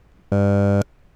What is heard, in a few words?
Cardboard glot100 filtered